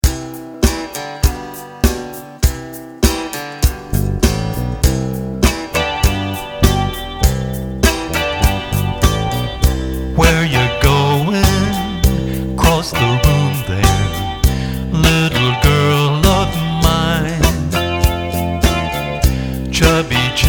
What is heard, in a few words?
Separated Guitars